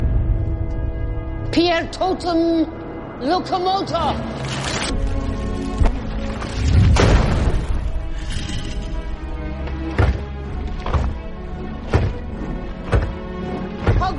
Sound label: guitar theme bgm